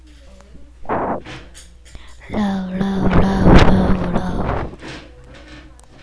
laugh

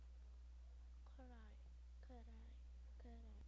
cry

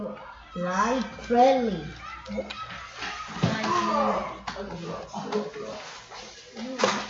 lid

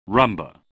rhumba

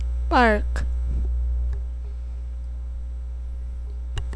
bark

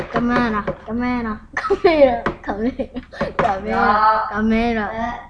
camera

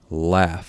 laugh

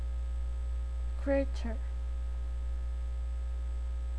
creature

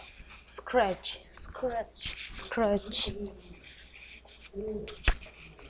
scratch

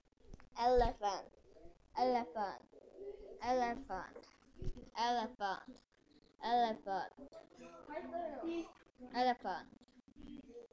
elephant

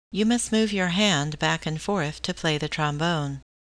trombone

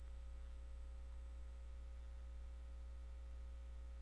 knife

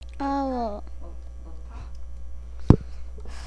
owl

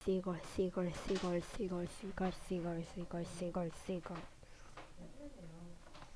seagull

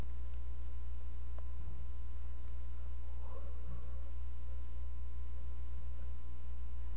cry

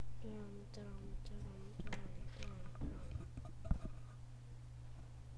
drum

